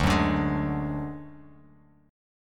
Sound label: D Major Flat 5th D Bass Standard, chord; strummed; JGuitar